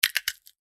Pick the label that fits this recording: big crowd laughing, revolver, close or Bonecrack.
Bonecrack